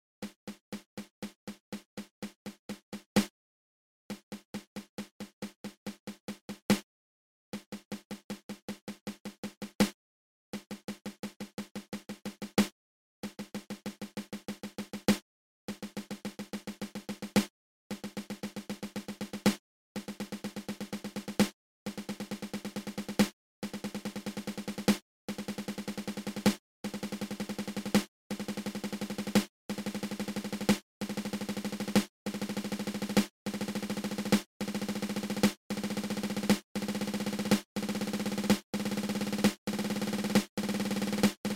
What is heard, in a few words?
Thirteen Stroke Roll